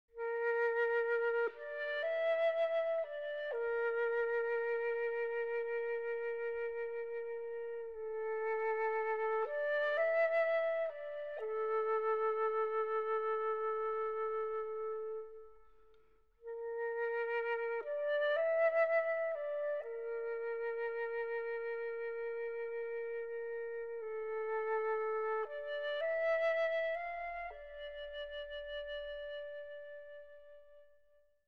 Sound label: Flute Solo